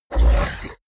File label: fishman snort short; kreaturen